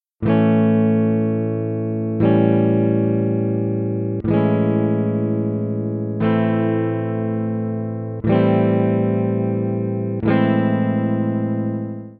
Types of Seventh Chords

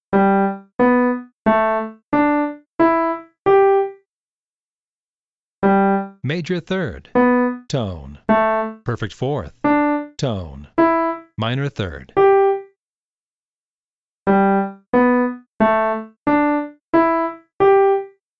melody in intervals

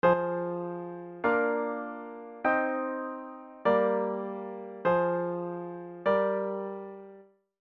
CHORD PROGRESSION

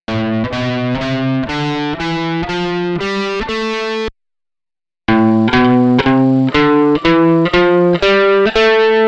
Pickups Distortion, guitar tone

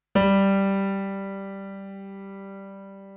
tonic; Long Long Ago

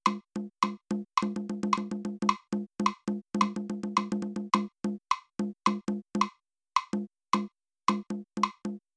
rhythmic accuracy drum bad; Rhythm Boot Camp

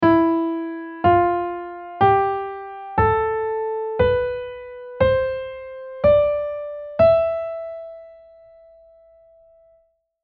E Phrygian

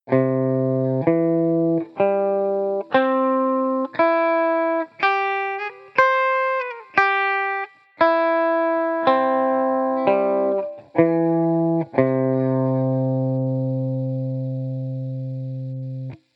scale example

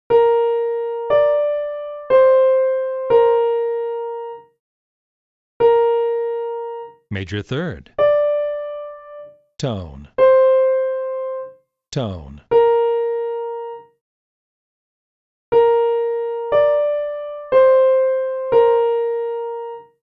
Hard Melody Intervals

